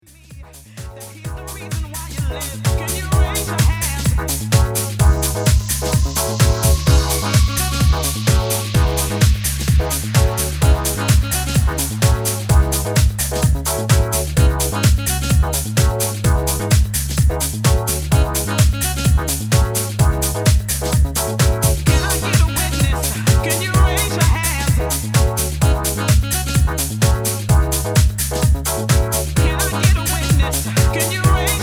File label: Dub